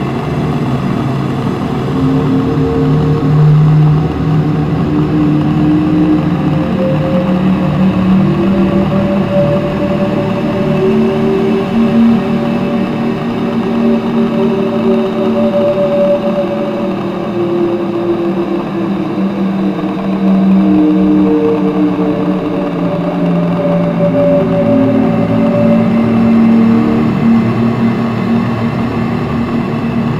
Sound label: Space Trench Run Amb; Ambience; Space Battles; Exterior; Warframe